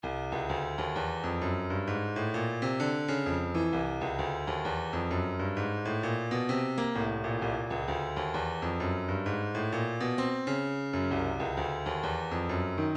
breakbeat 065 bpm; jay boyz; Stylus Breakbeat Midi Files

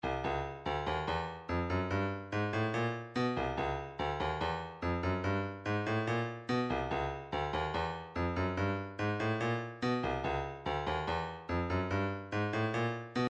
hihat

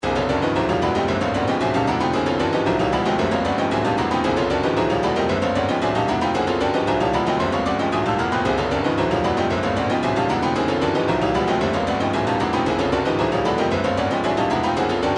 retro funk, midi; brother man

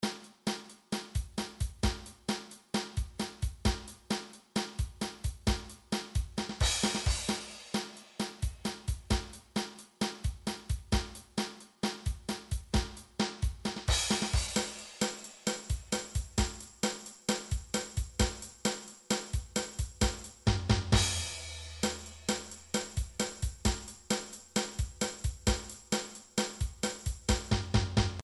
drum midi pop; Drum Midi Files Collection

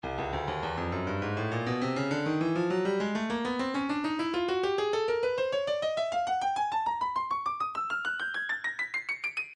breakbeat 101 bpm; freemont b; Stylus Breakbeat Midi Files

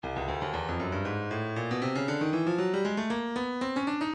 breakbeat 117 bpm; Sizzle D; Stylus Breakbeat Midi Files

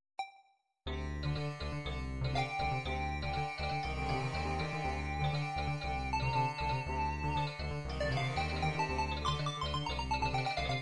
bulgarian horo, midi; Gjupsko Horo

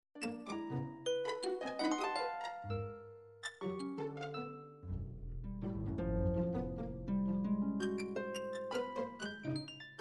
classical 12 tone, midi

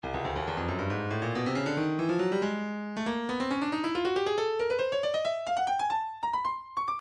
breakbeat midi files 130 139 bpm 138; Stylus

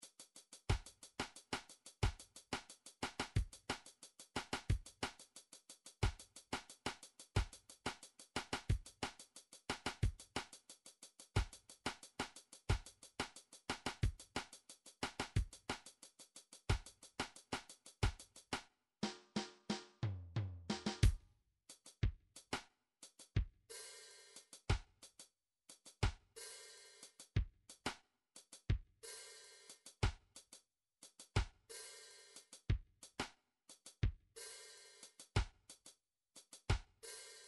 drums reggae

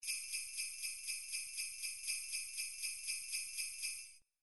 individual drums sleighbells

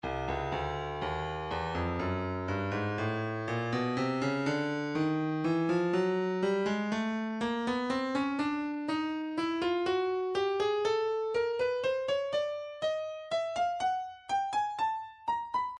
breakbeat 061 bpm; Stylus Breakbeat Midi Files 060 064 Bpm; Lumpy A

